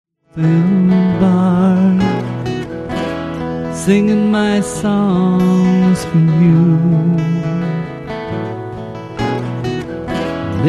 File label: acoustic